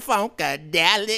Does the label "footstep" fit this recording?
No